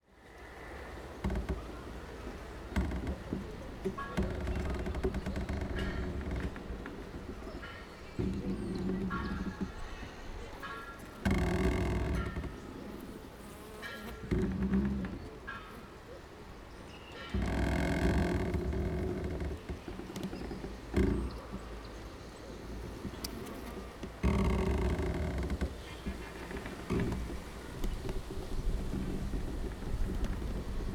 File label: DAYTIME, TREE MOVING IN WIND VERY CLOSE, WOOD CREAKING IN WIND, SMALL TOWN BACKYARD, BIRDS, ETHIOPIA; Creative Audio Pool; MOTHERLAND ETHIOPIA